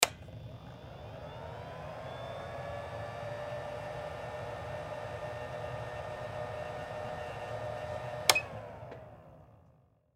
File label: Light Photo Professional Photographer Turn On Power Up Turn Off; Click; Blastwave; Technology